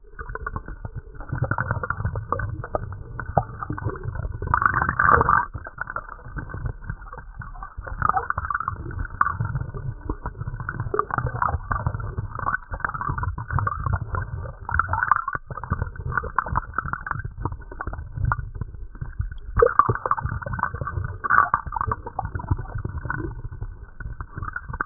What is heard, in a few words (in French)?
asmr sous marin apaisant